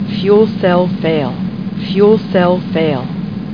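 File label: warning fuel cell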